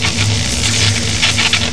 electric car, rumble; golgotha

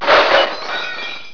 breaking glass; destruction

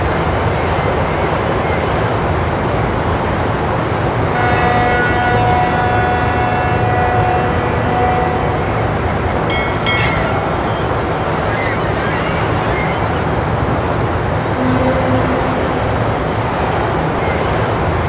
sea seaguls shiphorns; creatures; birds; mono; golgotha

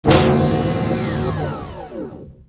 vehicle done; golgotha

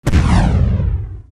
supertank fires rocket; modern weapons; golgotha

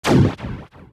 fire two, modern weapons; golgotha

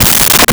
coarse gravel, footsteps; creatures

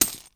coins bag

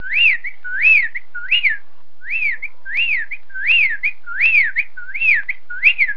bird whipperwill; creatures; birds; mono

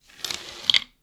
coins pickup

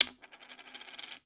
coins table

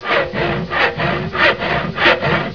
sawing

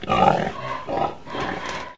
eating, pig; creatures; quadruped

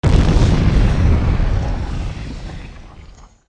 acid, modern weapons; golgotha

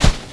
dry sand packed; creatures

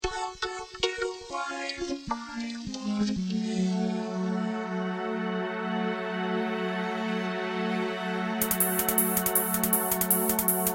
stereo bounce; music

